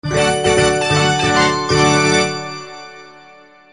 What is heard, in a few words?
win; Brazil; buraco